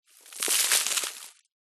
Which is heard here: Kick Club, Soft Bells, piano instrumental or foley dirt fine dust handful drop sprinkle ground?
foley dirt fine dust handful drop sprinkle ground